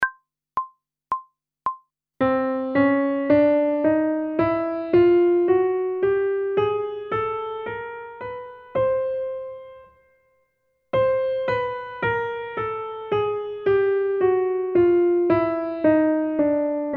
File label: Chromatic Scale one octave ascending and descending, pop vocals; grade 5; Technical Work